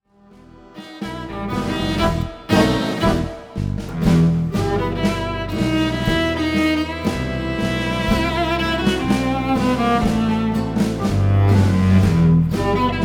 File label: Cello